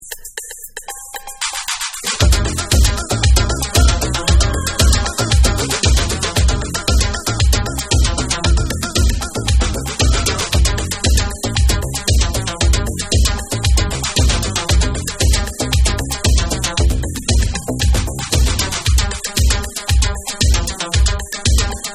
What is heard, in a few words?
Dub Mix